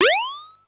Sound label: Jump; SADX